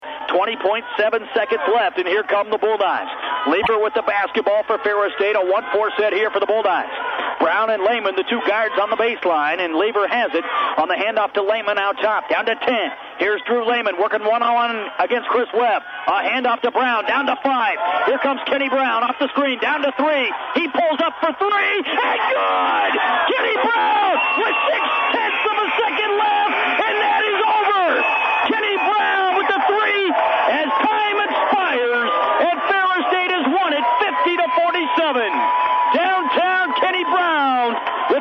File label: Buzzer Call; SVSU